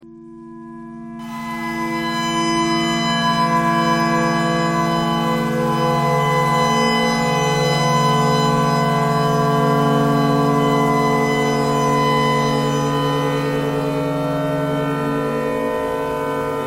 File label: tornado siren clarinet thread noise